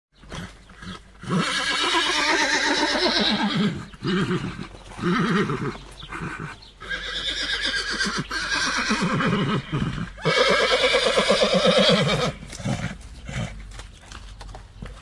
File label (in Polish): Rzenie konia; na farmie